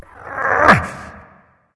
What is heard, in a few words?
nv grunt lift; cernunnos